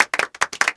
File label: clap; Rockers